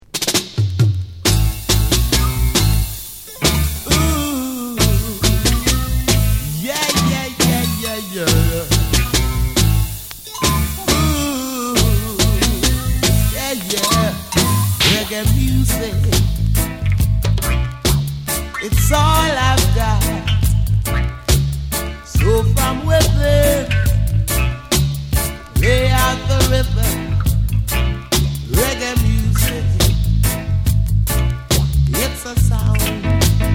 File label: Reggae Sound